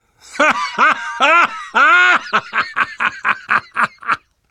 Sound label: laughter; l4d bills